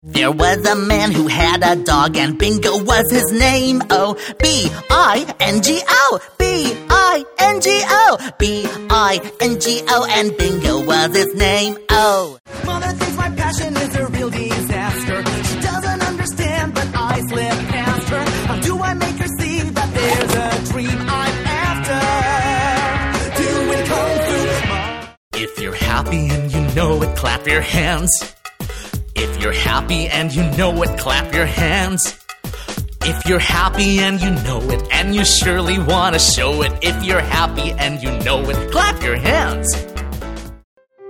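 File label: American English, songs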